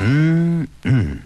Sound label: mmm hmm; ooh ahh